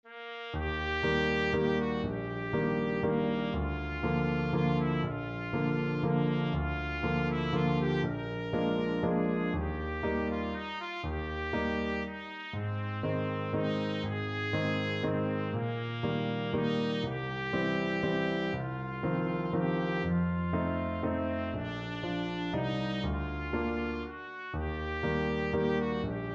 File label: trumpet